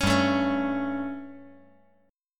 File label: G Suspended 2nd Flat 5th G, chord